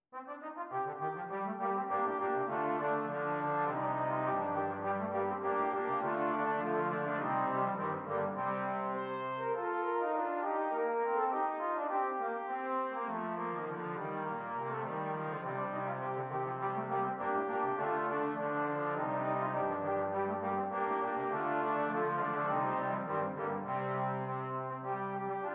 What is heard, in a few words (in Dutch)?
4 trombonekwartetten uit de Renaissance; Deze vier trombonekwartetten zijn van oorsprongen stuuken voor koor